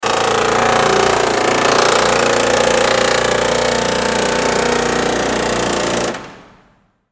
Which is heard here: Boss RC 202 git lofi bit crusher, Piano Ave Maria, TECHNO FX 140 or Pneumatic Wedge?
Pneumatic Wedge